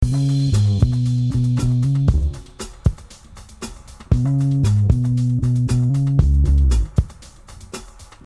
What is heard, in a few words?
depressing rock music